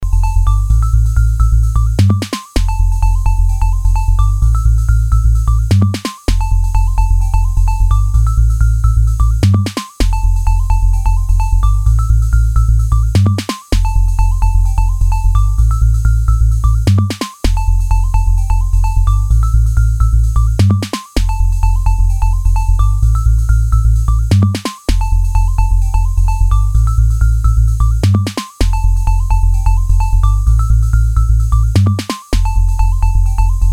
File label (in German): Nochn Beat